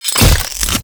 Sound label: Machete Finisher Stab; Sentient; Warframe; The New War; Kahl; Weapons